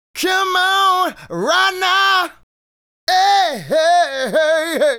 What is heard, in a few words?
Male Solo, Hip-Hop and RnB Vocals